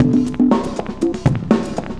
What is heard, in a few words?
cooling 120 bpm; loops